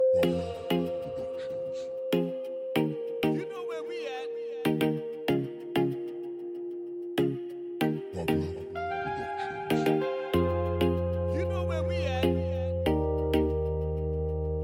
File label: Latin Reggaeton